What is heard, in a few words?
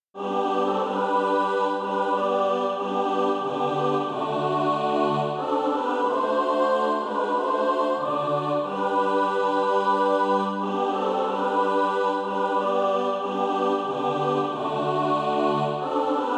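mixed, SATB Practise Music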